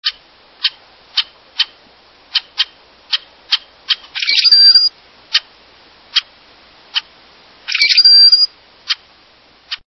Rusty Blackbird

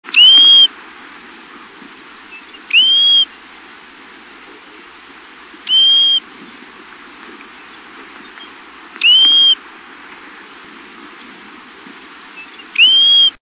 Pacific Golden Plover

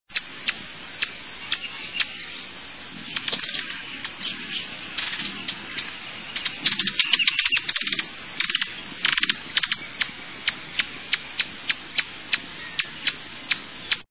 Violet crowned Hummingbird

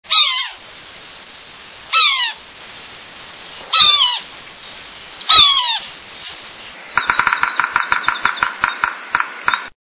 Yellow bellied Sapsucker

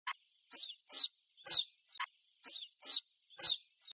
Common House Martin, songs

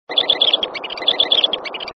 Bairds Sandpiper

